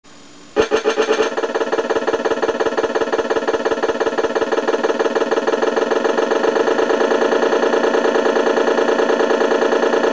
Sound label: 3 cyl diesel